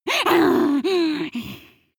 witch snarl aggressive; kreaturen

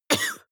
cough male single soft short; soundpool

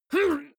male fight pain groan short; vocals; comedy-cartoon; soundpool

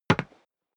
step boots wood parquet single left; schritte

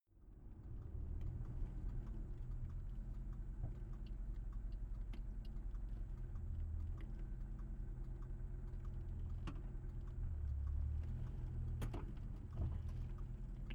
car jaguar e-type idle interior

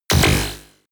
sci-fi buzzing shot; schusswaffen

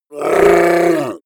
orc call pain

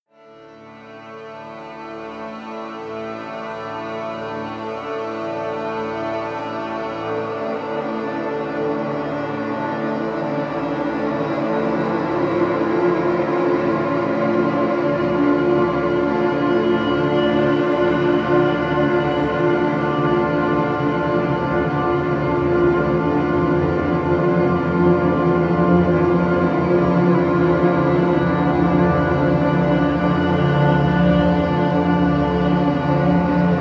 drone the orchestra

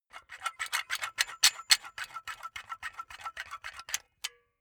silicone gun empty pump fast; berufe; handwerker; soundpool

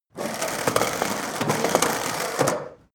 skateboard indoors drive light; sport freizeit

